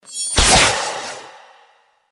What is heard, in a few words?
arrow charge Atk